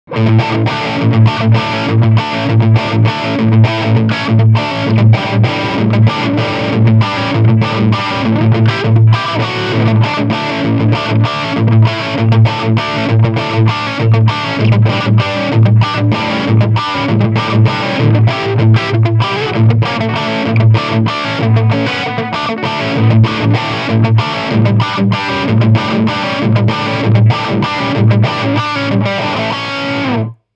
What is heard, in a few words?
Channel 2 Riff